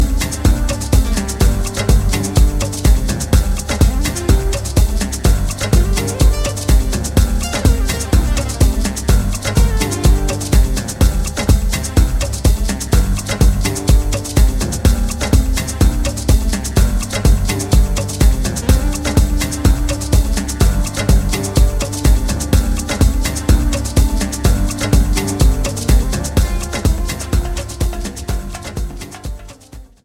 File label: ALT INST